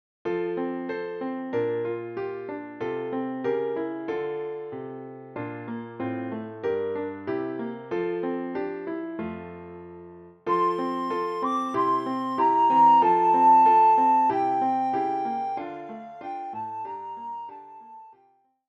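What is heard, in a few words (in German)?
Alle Jahre wieder, Sopranblockflöte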